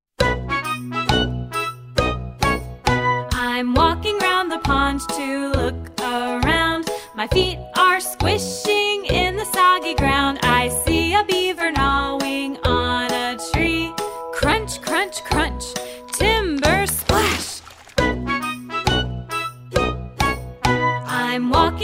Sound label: Splash